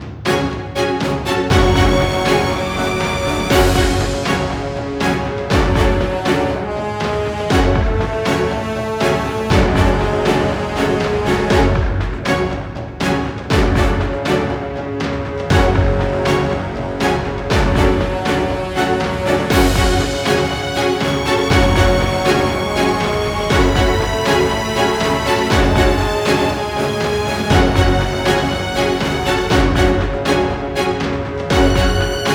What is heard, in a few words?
tension; by burak sound bank; tension gerilim vol 45